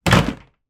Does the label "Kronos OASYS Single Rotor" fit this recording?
No